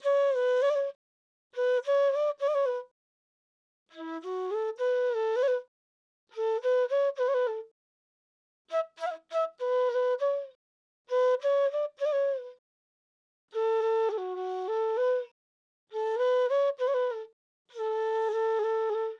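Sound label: Trap Flute A major 100 BPM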